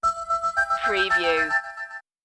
pulsating lights stinger

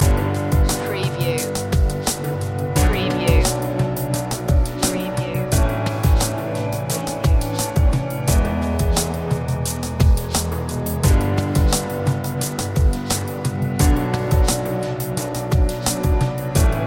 growing radiance loop

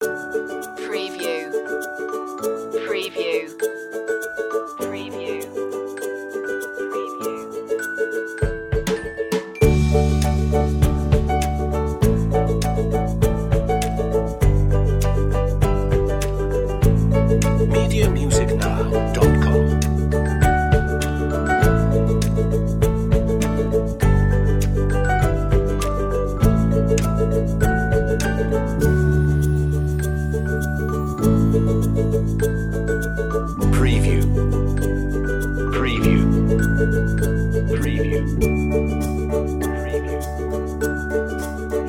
ukulele commercial laid back

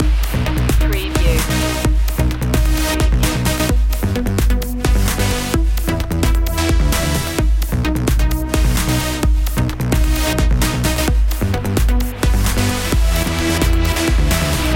summer trance loop

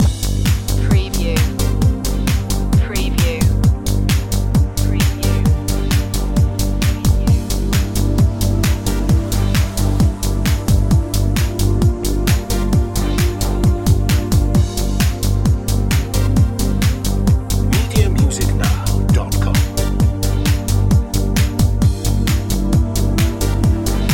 digital concepts loop

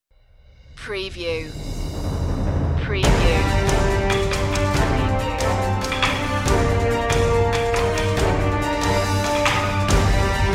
epic adventure

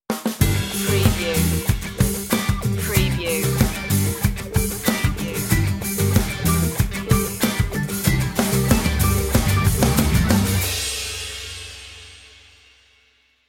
crash stinger

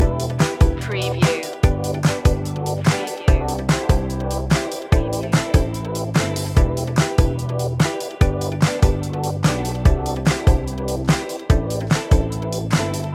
summer breath loop

